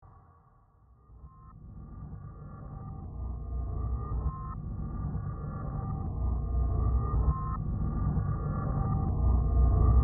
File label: Trombone Full Song